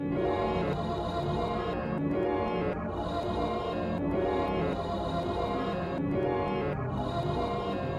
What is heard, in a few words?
Long Hallway - Ambient